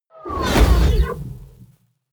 fire whoosh sci-fi small

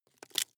wood chip small break creak

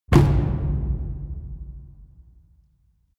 drum mid loose

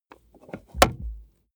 plastic plate crevice click

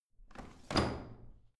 washing machine drawer in squeaky

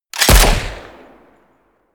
shot

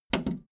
video tape player plastic fast forward click slow

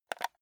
console controller home press fast

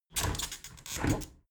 window open heavy sequence

